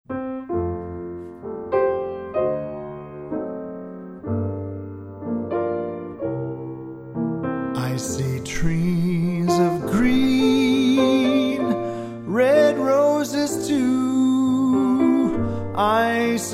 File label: piano